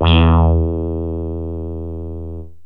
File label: voco bass, yamaha rm1x synth